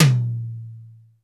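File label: dry tom, dry kits; Crypton; DrumBase5000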